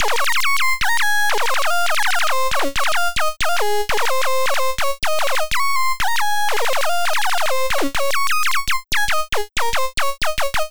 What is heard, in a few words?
Main Lead